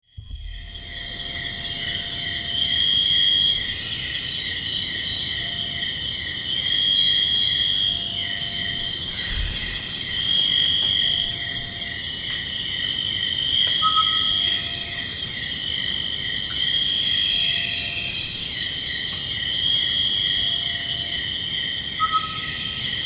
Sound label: Malaysian Rainforest